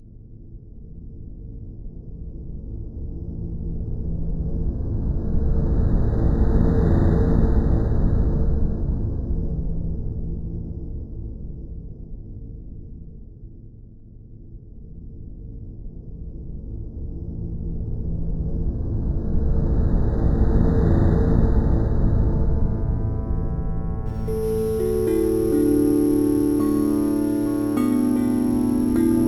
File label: drone